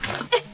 landing; Rayearth

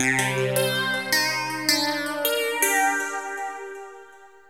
ELECGUIT, AMBNT, 160, C; Ambient; Guitar

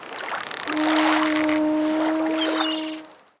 ferry land; PHARAOH

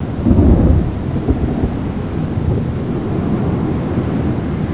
THUNDER

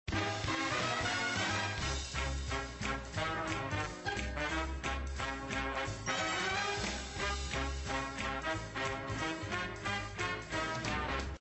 simple Loop; aventura

